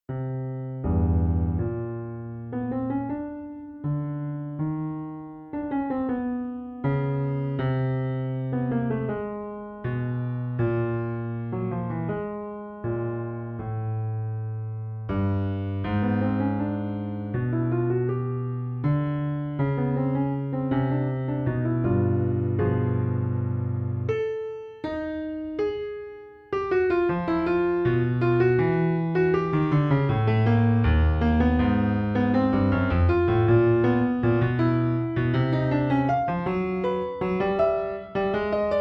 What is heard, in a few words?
piano